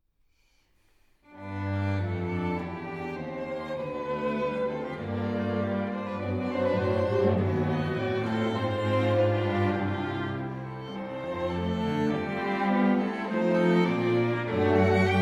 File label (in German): Streichquintett, 1. Streichquintett 2 Violinen, 2 Violen, Violoncello; Streichquintett Nr. 1 1. Satz